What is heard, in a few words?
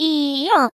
Eeuh; SM64 CO-OP; Triple Baka Pack; Miku